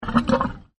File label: Camel Voice; Camels; Partners In Rhyme